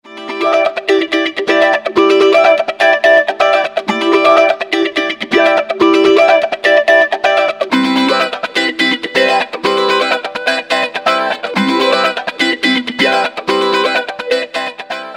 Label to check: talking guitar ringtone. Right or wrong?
Right